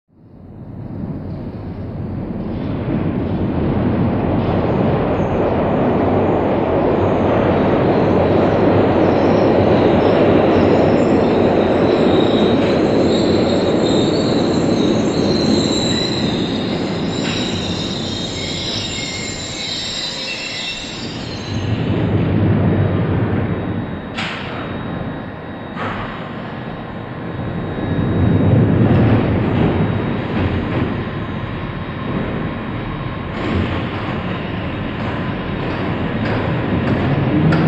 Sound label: Electric Train Into Station And Out Of Station.; In / Stop / Away